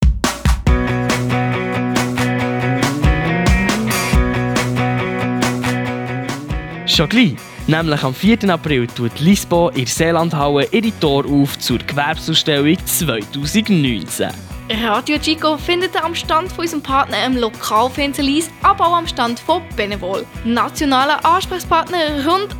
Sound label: jingle; RadioChicco